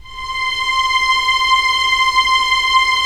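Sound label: string section; Roland - LA Composer vol. 1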